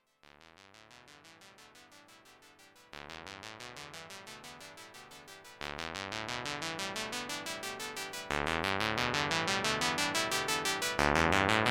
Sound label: A110 Pulsewidth